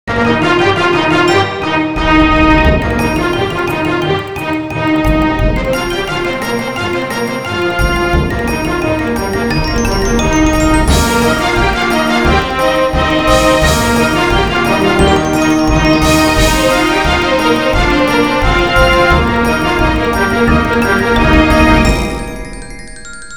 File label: firework music; Poodletooth-iLand